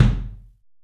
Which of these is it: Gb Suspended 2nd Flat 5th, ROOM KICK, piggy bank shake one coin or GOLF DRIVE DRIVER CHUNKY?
ROOM KICK